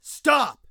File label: stop; Escape from Tarkov